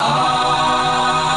Key chant; luxembourg zwee

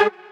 Mainlead Melody

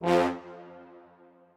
strings

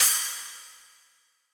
cym

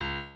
piano

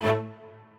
strings

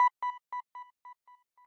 arp

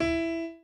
piano chord

